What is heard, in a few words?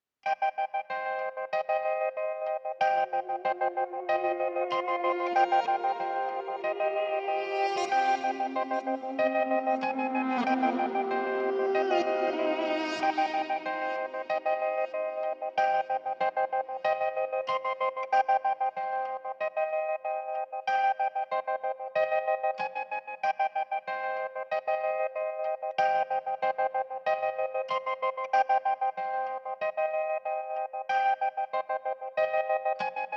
guitars